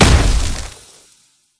salt hit

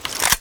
grenade open

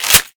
Q Pump

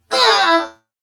death

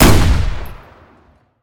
fire, explodium gun

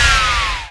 Wind

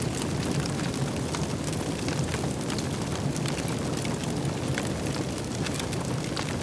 on fire

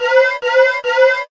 WARN

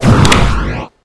Pick

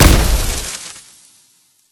salt hit

